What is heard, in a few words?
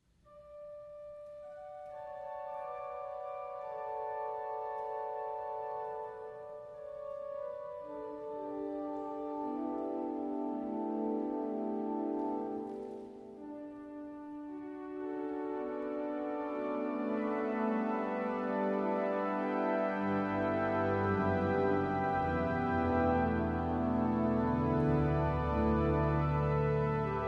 String Division